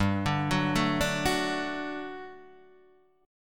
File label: G Major 7th Suspended 2nd G, Guitar Standard; arpeggiated